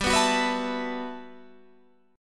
G Major 13th; Listen to GM13 strummed; GM13 Chord

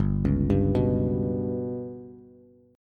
G Major Flat 5th Bass, chord; GMb5 arpeggiated; JGuitar

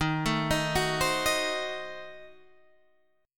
A Diminished 7th D sharp

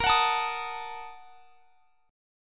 A sharp Major 7th Suspended 4th Sharp 5th; strummed

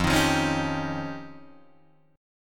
F 7th Suspended 2nd Sharp 5th, Guitar, Drop D

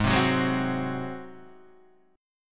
G sharp 7th G sharp down Guitar Standard; G#7; strummed; JGuitar

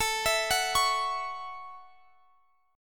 A 6th A, chord

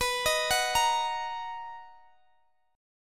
B Major 7th, Guitar Open D chord

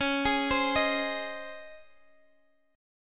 Db Major 7th Suspended 2nd, Guitar Half Step Down

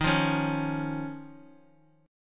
Eb 7th Suspended 2nd

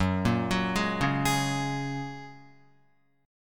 Gb Major Add 9th, down, Guitar; arpeggiated; JGuitar

